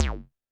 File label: percussion, Roland TD-20